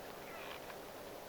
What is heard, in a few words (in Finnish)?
minka vesilinnun aani olisiko tavi